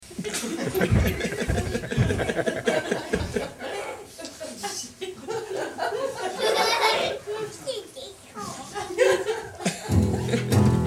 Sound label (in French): chien